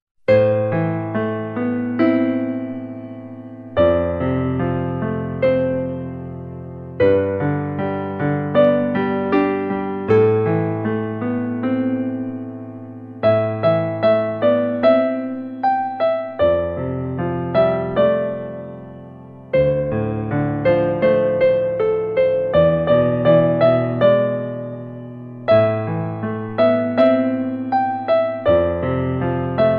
karaoke; Naruto

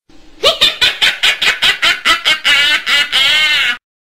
Risa de la bruja, Laughing Witch Sound; Efecto de Sonido

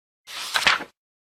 Turning book page sound effect

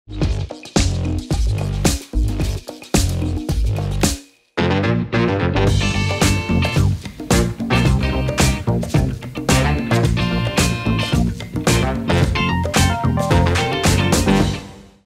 music only

